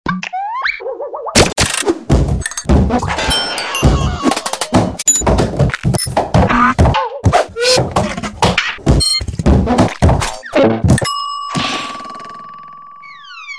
Robot Boxing Match; Series Of Fast, Cartoony Impacts And Effects.; Cartoon Gadget FX